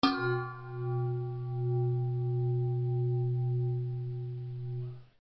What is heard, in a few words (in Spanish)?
Resonancia; Banco de Sonidos - Facultad de Art; Lazzeri - Gallichio - Alperte - Calvo